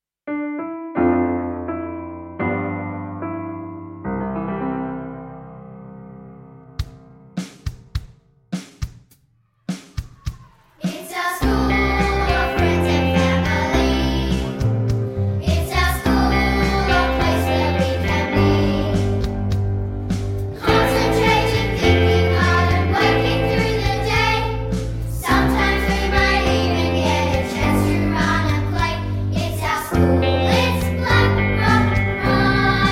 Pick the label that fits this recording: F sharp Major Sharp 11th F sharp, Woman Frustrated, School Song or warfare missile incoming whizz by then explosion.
School Song